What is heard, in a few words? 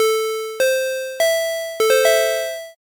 square sequence